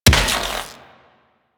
Inf Quanta Rifle Shot C; Warframe; Infested

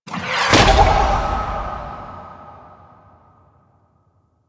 Golden Band Staff; Warframe; MonkeyKing

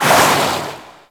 Shark Tail Move Fast; Warframe; Grineer Ocean Shark

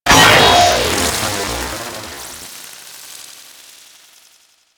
Microwave Pistol Death Burst; Grineer

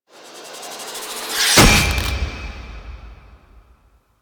Sword Impale; Cinematics; Stalker; Warframe; Orbiter Cradle

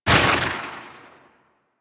Inf Quanta Rifle Tail C; Warframe; Infested

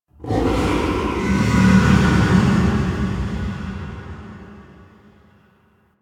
Growl Interior; Ambience; Unum Murex; Warframe; Quests; The New War; Cetus; Unum Tower

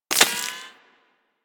Bullet Impact Debris Dirt; Warframe; Weapons

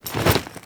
Generic Foley; Warframe; Warframes; Temple

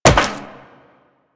Wall Jump Metal Perforated

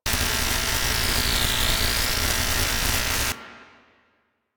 Electro Mag Charge Loop, Weapons; Warframe; Corpus

